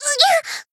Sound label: damaged, jp